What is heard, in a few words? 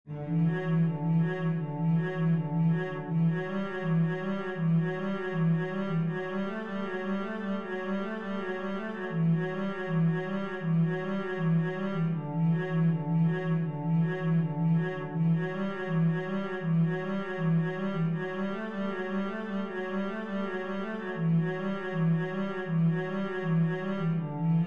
etude, cello